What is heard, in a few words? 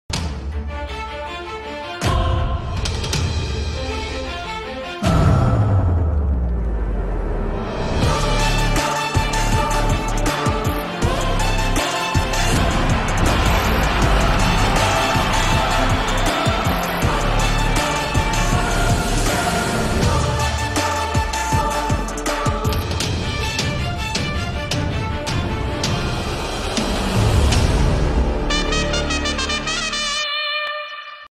cricket live original background music; ipl bjyus cricket live